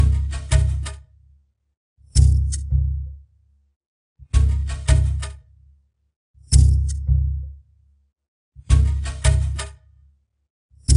Jungle Level

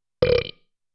Burp; dot hack; Puchiguso

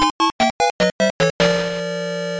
Death; kung fu kid master system

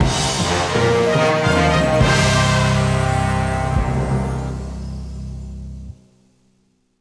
Newsreel Sting; lego the incredibles 2018 pc

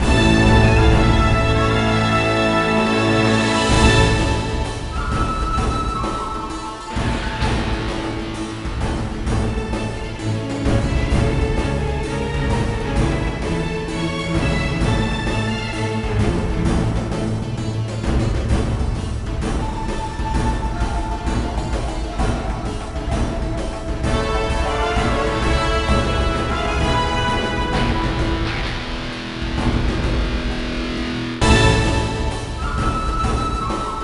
Victory Egypt; Age of Empires Mythologies; NDS

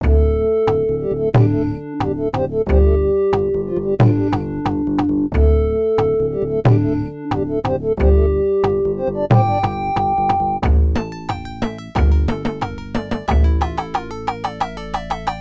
Ending; Samurai Aces; arcade

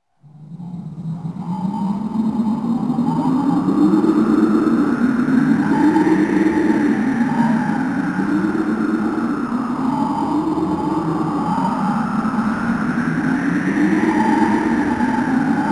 Wind Ambience

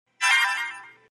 SFX Heal

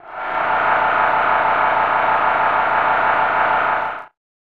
Crowd Cheering; snes; Tecmo Super Bowl

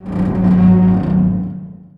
Creak Metal Stress

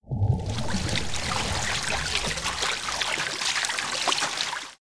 Water Ripples

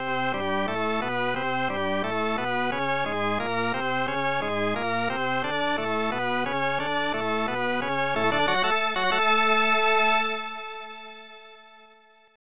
Baseball Fanfare; Roger Clemens' MVP Baseball; SNES

